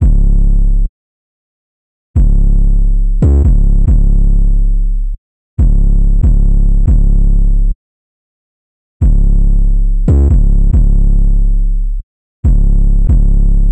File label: 808 loop 140 BPM C# min; Cymatics